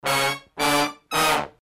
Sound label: brass loop